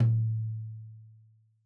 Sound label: Tom2 Stereo, Deeply Sampled Drums; SMDrum